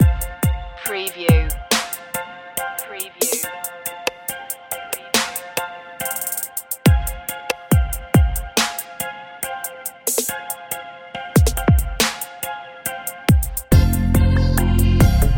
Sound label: your own trap main loop